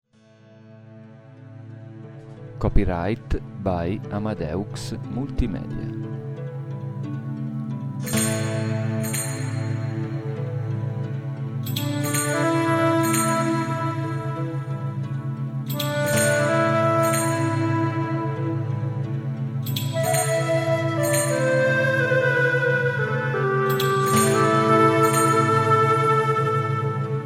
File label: flute